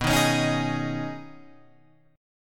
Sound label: B 6th Add 9th B Guitar Open F, chord; B6add9; strummed; 11,10,13,10,11,10